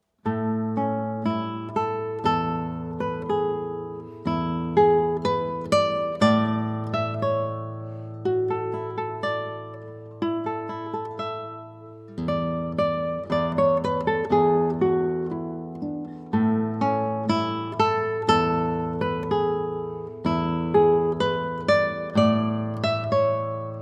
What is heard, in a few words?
sweet guitar